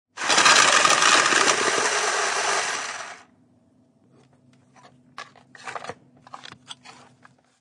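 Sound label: card shuffling machine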